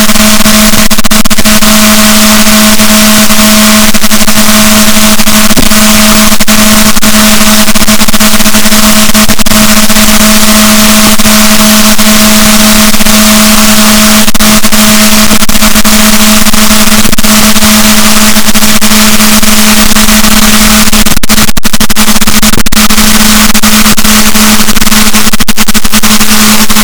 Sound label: rorquals, Balaenoptera Physalus, boat